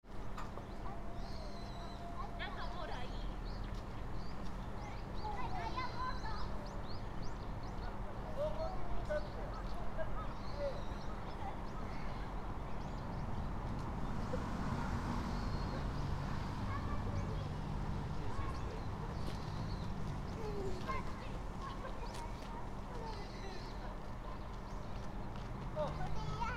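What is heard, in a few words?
shinobu, Fukushima Soundscape; Mt. Shinobu Park; Koji Nagahata; Bears were seen a few days ago, so posters warning about bears were put up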